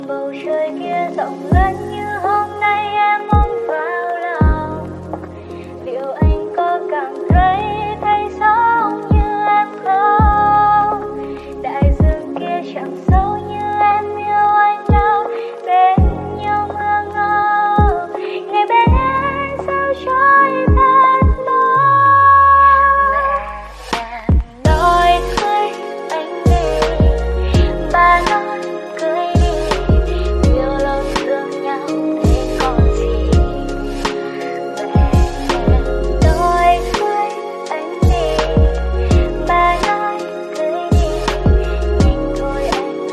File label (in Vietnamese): Nhac Chuong Lofi Ver